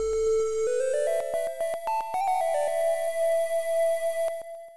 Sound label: Ocarina; Neutopia II; TurboGrafx 16